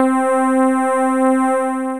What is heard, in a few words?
Dirty Pulse, Synth Keys; Ableton; Factory Packs; Beat Tools